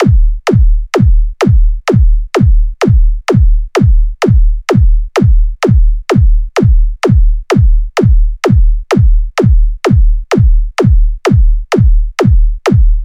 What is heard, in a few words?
Beat 128 bpm